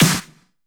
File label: Clap; Vengeance Samples